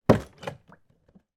fruit juice carton place down on table with juice slosh inside; food drink